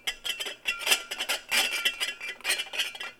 glasses rattling

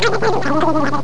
GREMLIN

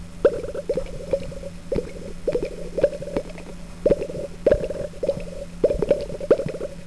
BUUBLES

